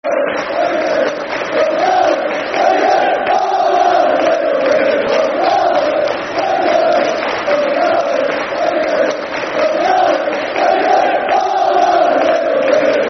We Love You Alex Football Chant Soccer Songs; Crewe Alexandra Cafc

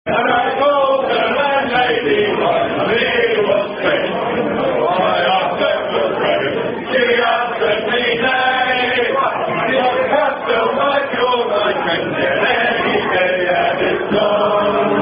Rugby Gents Away Day - Wild Rover; Footscray Tonbridge Juddians

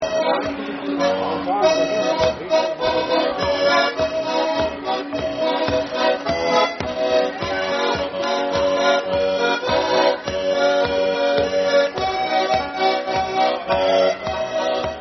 Polka; Belanger

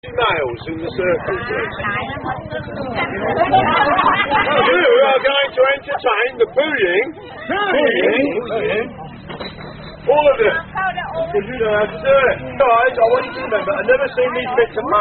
Unknown Hash Song

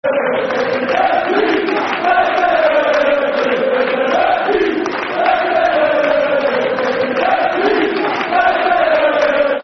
Football Chants (Soccer Song); De De Der Der Yids; Tottenham Hotspur Thfc

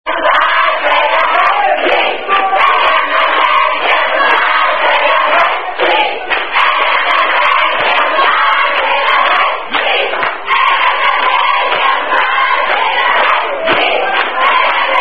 Gamma Phi Beta Singing, Chanting

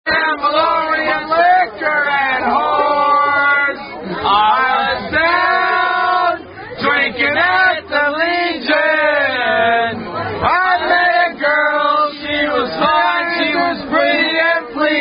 Pilot's Song; I Love Newfies; Unknown Pilot's Song

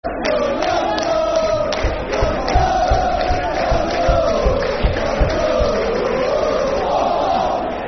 Time To Go Football Chants (Soccer Song); Birmingham City Bcfc

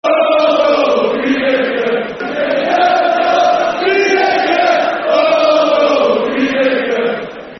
Football Chant (Soccer Songs); Greenacre; Tranmere Rovers Trfc

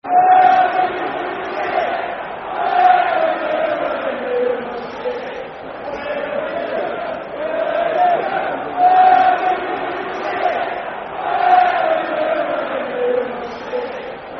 Where Were You, Football Chant (Soccer Songs); Fulham Ffc

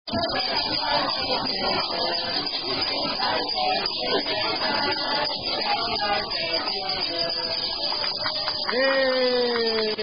Happy Birthday Sung By Wait Staff; Outback Happy Birthday (Standard) Sung By Outback Wait Staff

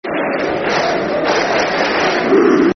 Clap Blues, Football Chants; fanchants; Ipswich Town Itfc

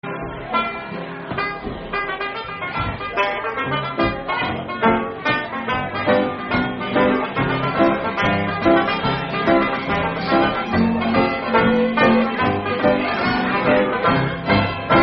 Schottische; Nameless Schottische

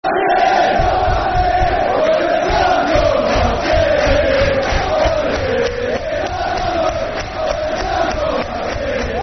Town Goes Marching In, Football Chant; Soccer Songs; fanchants; Huddersfield Town Htfc

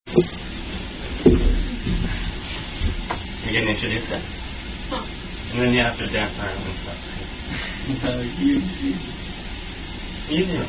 Attack Song

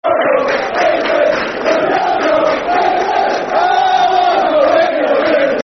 We Love You Argyle, Football Chants; Plymouth Argyle Pafc

